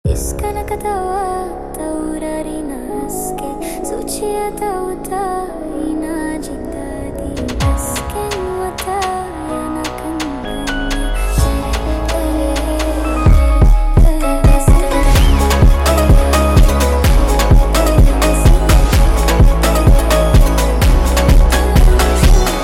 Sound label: Funk sped Up, funk